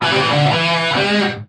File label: straight up guitar lick